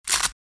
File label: Tearing velcro on boxing gloves